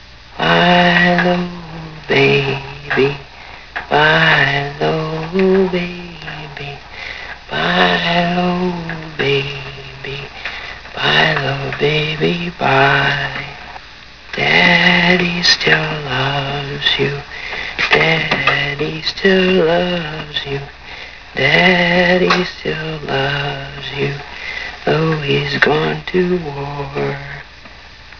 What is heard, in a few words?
kids lullaby